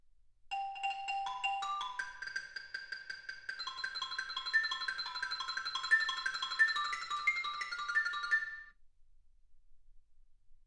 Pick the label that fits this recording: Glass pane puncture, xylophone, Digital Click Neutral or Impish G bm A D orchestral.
xylophone